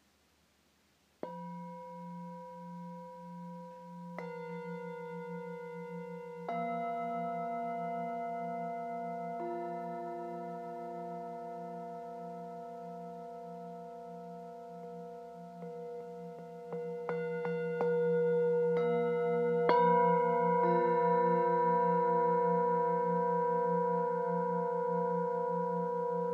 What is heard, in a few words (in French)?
Bols tibetains